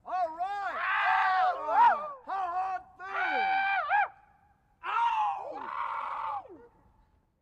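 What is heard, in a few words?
Male Catcalls Exterior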